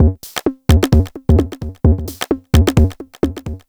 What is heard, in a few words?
Beat (130BPM), techno; techno drum fx